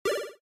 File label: minesweeper menu select, arcade